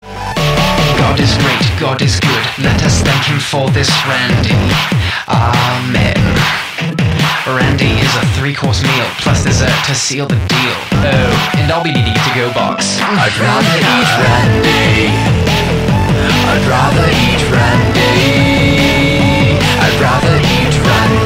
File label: happy birthday ringtone